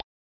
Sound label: player join